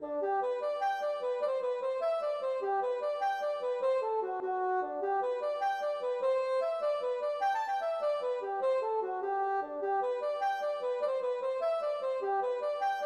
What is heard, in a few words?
jig